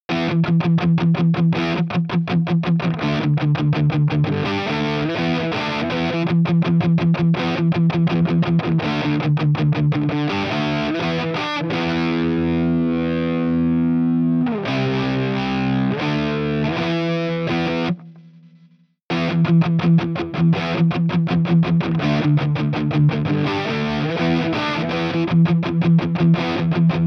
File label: crunch